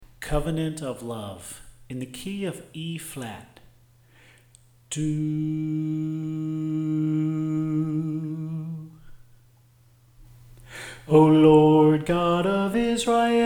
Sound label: Bass